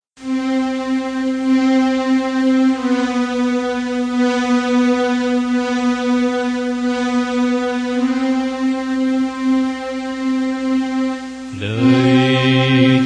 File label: ALTO